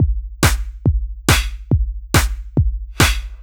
Kick Loop, 140bpm

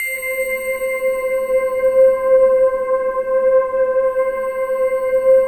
World of Synthesizers, SYNBELL

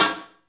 SD, Drum & Bass, 160 BPM; Ueberschall; Drum & Bass Flash Trackz

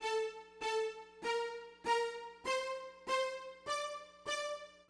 Smooth Groove Strings, 70s Analog Funk

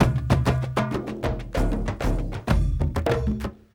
Dunk A Boong, Drums; Sonic Foundry (Sony Creative Software); Friendly Fire; Marc Steve

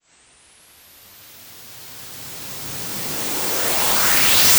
FX Sweep Up

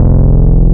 VCO, wavetables; Animoog

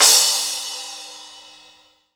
cymbal, Crash Cymbal, mono crash; AKAI S6000 CD-ROM - Volume 3

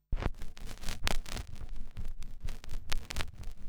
Rythmic Noise 130BPM

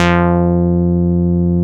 101 G, SYN; INIS

